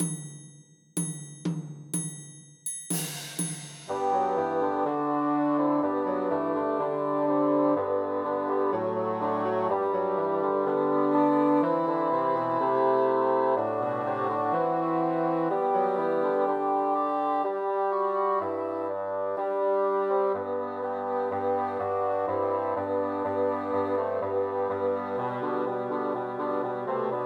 Joy to the World Christmas Trios for Three Bassoons